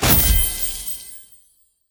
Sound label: chomp; sobek; smite